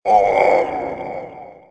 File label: motor death; merge-dragons-2